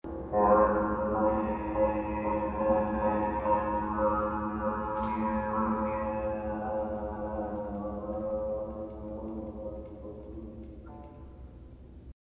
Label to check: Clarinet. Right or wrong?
Right